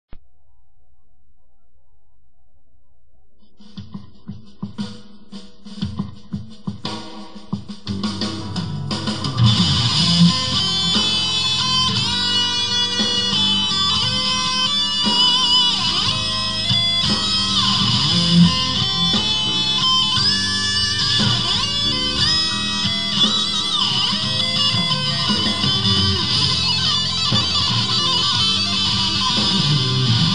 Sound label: guitar